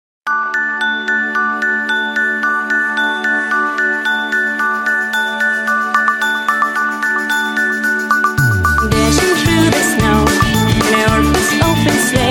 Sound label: Jingle Bells